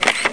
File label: shutter